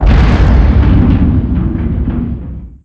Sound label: Med Explosion